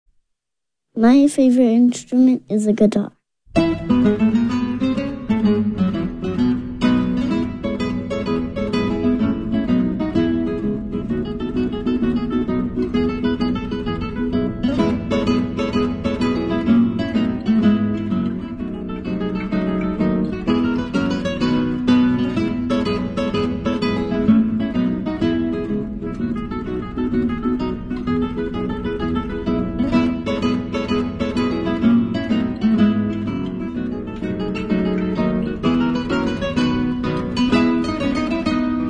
Guitar Quartet

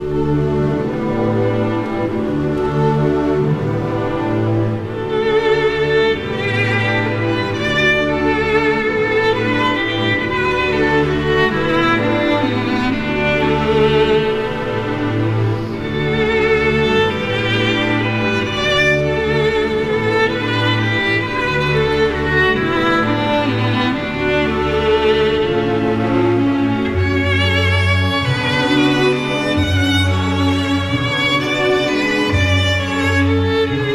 Berceuse (Lullaby); Op. 16

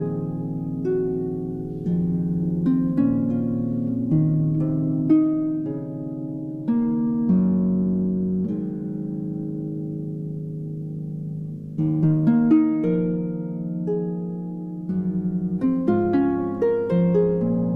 Harp Concerto Lullaby

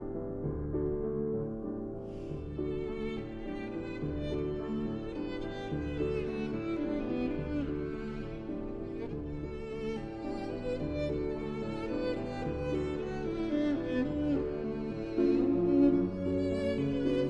Violin and Piano in D

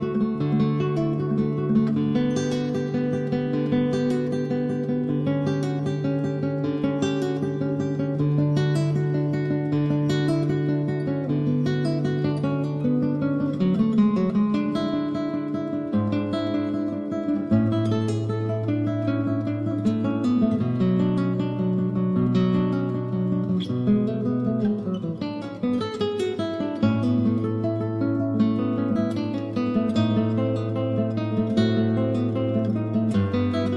Cello Suite No. 1- Prelude

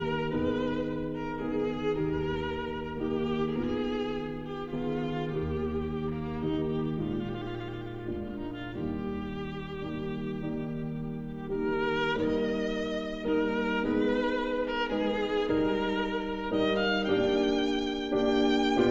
Sicilienne for violin and piano

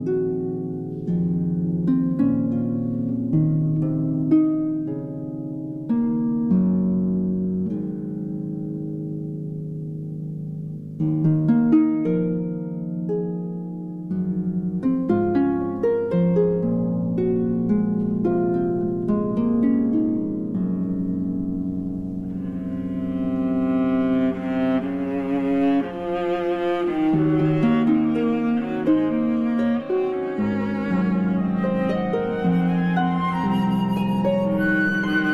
Harp Concerto Lullaby

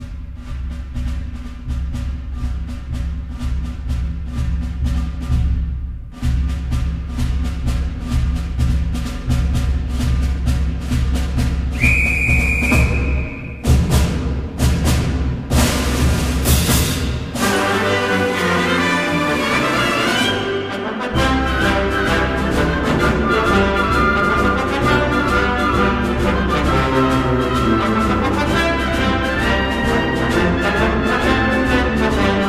Trombones, Orchestra; BBC Concert Orchestra

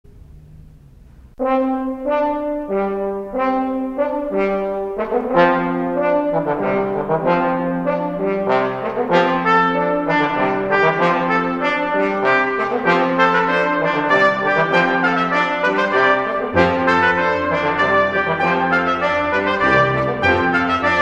Carillon Brass Medley; TRADITIONAL; arr. Don Hart

